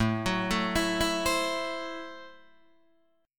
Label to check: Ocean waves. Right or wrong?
Wrong